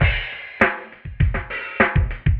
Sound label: drloop, drumloops, Vinyl Attack; Masterbits